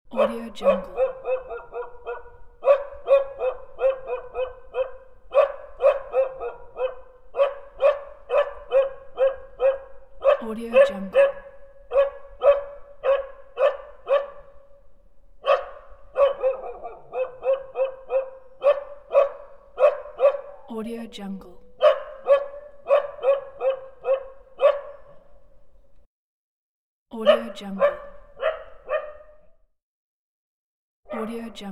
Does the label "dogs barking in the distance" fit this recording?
Yes